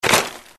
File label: land bike; disneyxd; stuntastic